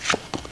Flip Card on Deck; iPoker 2000

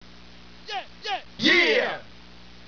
rap

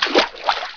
step water; SpecOps2 MPlayer Demo

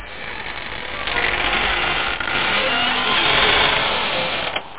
creaking

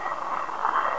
rotate; InventorLabs; TECHNOLOGY

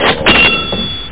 Bonus Cas; ultimate quiz ii

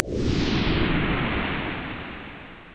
swoosh; SGI IndiZone 2; earth

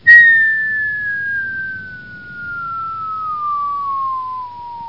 fall

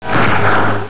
Close Program; Sherlock

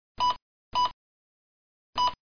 beep; Weird - The Truth Is Stranger Than Fiction

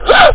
huh; Aminet 21; GTI - Schatztruhe; Amiga 8-bit Sampled Voice; 8,363 sample rate

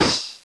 fireball hit; fireball hit 8 22 old; Ferazel's Wand Demo

